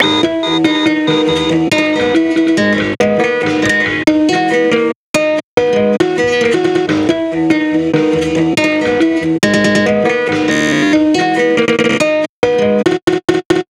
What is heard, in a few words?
guitar